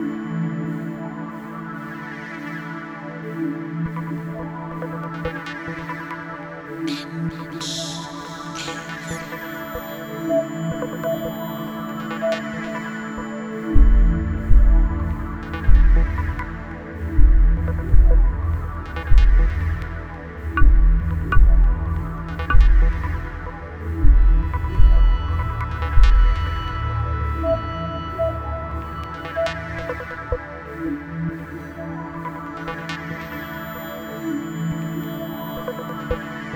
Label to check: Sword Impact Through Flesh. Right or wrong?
Wrong